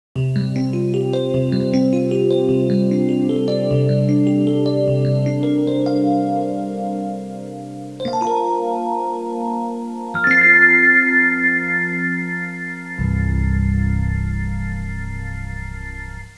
musica newage

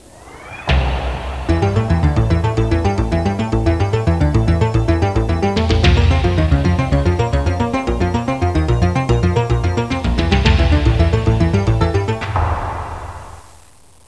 suonerie musica rock

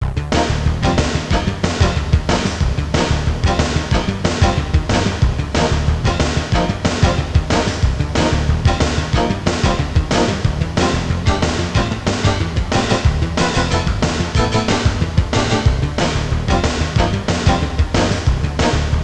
suonerie musica rock

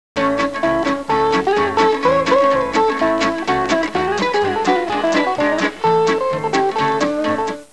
musica strumentale

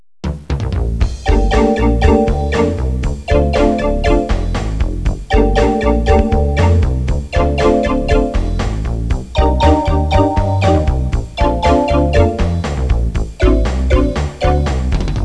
musica newage

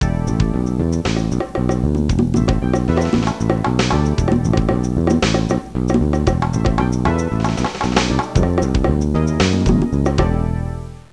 suonerie musica ritmica; rhythm

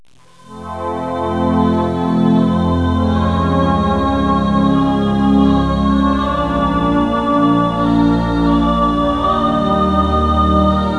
musica newage

